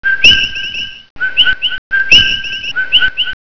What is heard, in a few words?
coqui